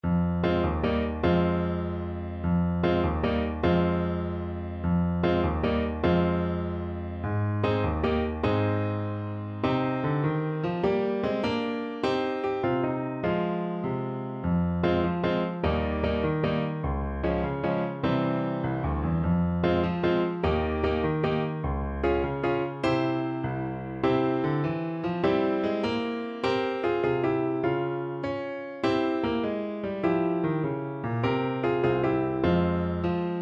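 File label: alto saxophone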